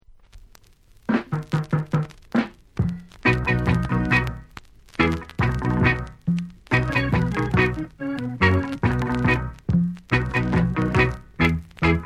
CLUB REGGAE

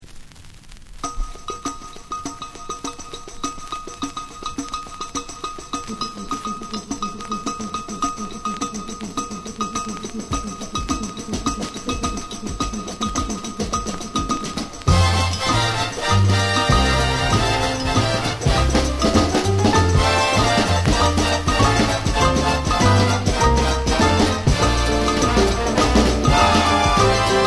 CALYPSO BRASS

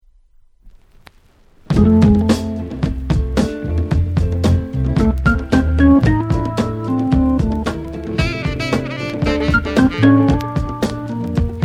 SOUL ORGAN

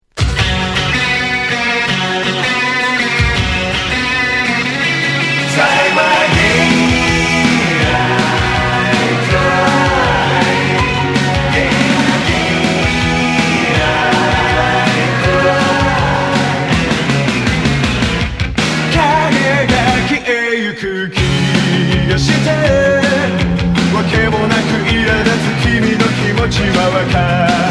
up beat

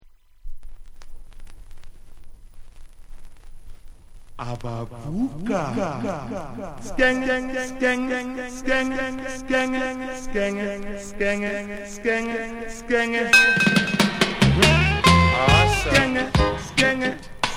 REGGAE

